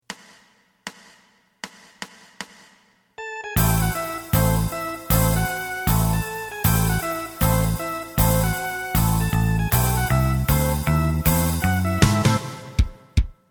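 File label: lead guitar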